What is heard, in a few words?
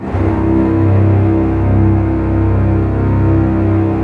orchestra C0 FF; Sound On Sound - Peter Siedleczek's Orchestra